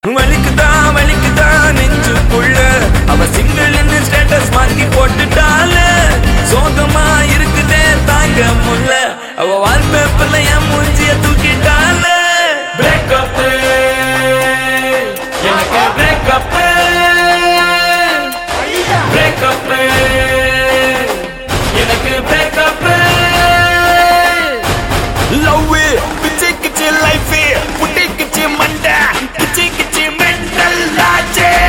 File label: love ringtone; tamil; naan sirithal